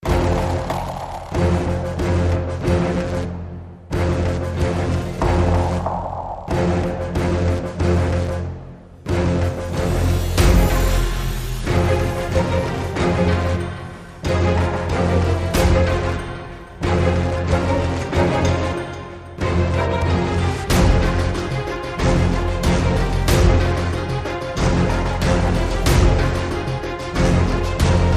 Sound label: No Choir